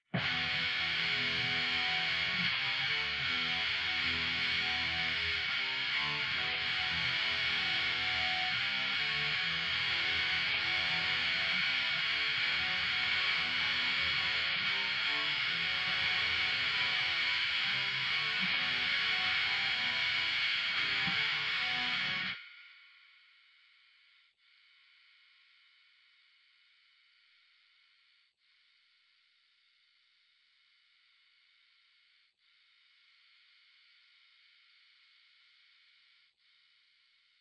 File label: Guitar